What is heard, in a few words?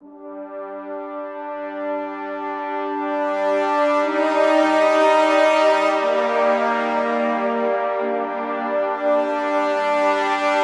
Brass - Swell Brass - Gm 60Bpm; Ghosthack; SkyRealm